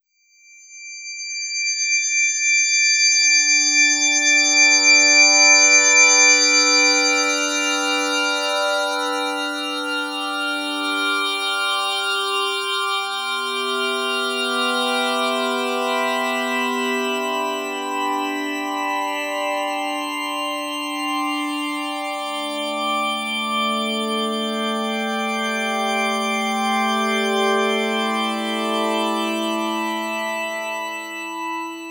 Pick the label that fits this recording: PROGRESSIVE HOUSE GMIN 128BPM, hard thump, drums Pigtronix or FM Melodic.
FM Melodic